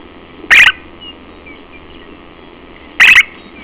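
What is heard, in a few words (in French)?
maubèche des champs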